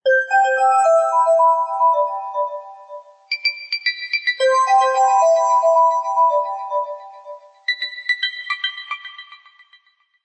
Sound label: xiaomi mi new ringtone